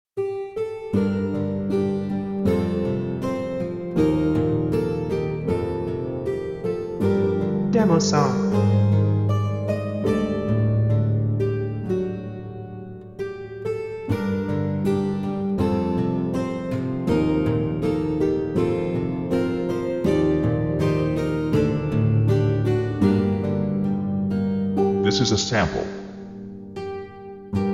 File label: Guitar